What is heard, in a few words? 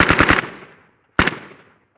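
gun ak47 distant; ModernWarfare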